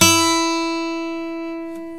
GUITAR FLUTE

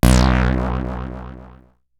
bass stabs, Drum Machines FutureRetro FR777

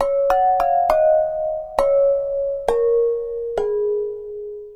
Flower Pot; Ambient; Melodic; Ambient-Melodics

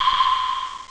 sonar; Amiga Soundtracker Sample Packs

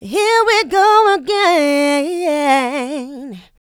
VOCA, Houseworx

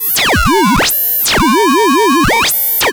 Osc vs Ring Mod, vintage oscillator; radiosonics

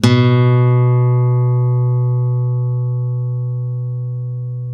ACUSTC B 1, ACOUSTIC guitar; East West; Steve Stevens Guitar Samples Collection; ACOUSTIC PCK

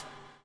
BUBL, BUBBLE; Spectrasonics - Backbeat

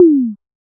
Tom, Realistic Concertmate-670; One Shot Kit

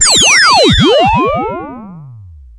OSC FX, OSCILLATOR FX; Zero-G Ambient 1

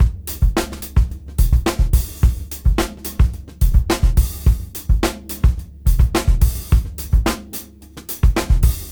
Junky Funk 107 BPM; AMG - Keith LeBlanc Phat Butt Jams

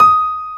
air piano E5

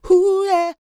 D YEAH, Vocal R&B Dance; DB DANCE

